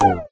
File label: message sent failure; There; ThereClient